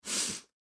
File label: Human Sniff, Sniff Fast; Sniffling; Blastwave